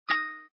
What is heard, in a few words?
btn open Ui; mahjong ningxia